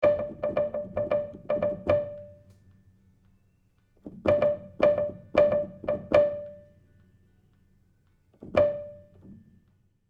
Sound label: Felt Pluck DRY